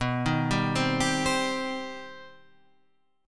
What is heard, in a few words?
C Minor B; Cm/B arpeggiated; JGuitar